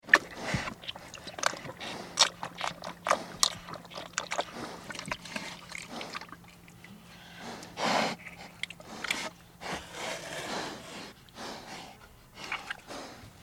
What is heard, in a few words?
Pig Eating and Snuffling, Close wet slurps liking and breaths; Pigs; Animals; Tom Hutchings